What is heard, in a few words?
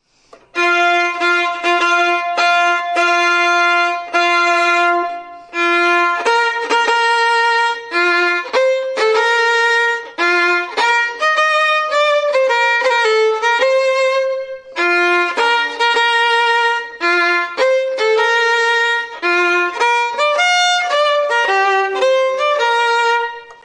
Bridal Chorus